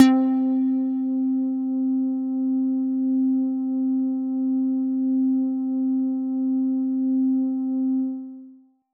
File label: Pluck; Space Jamz